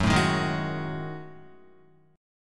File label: Gb Minor 6th Add 9th Gb down Guitar; strummed; JGuitar